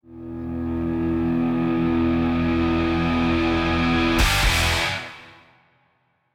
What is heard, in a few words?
Logoscreen Stinger; full throttle remastered ios linux macos ps vita ps4 windows xbox one gamerip 2017